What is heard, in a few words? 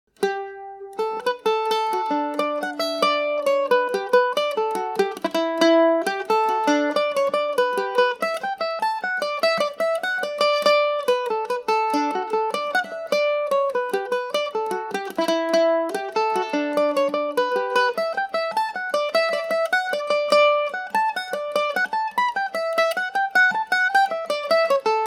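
D major, Irish mandolin; munster bacon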